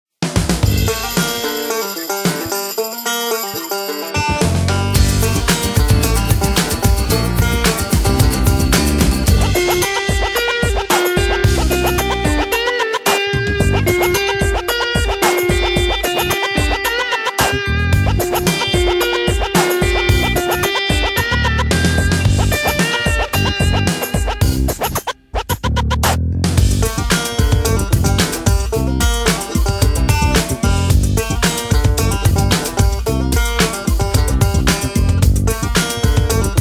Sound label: Instrumental